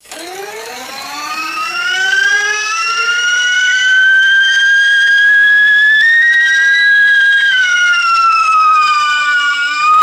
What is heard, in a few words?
mechanical factory siren winding up and down